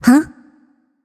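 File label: nonverbal confused; smite; voicepack; izanami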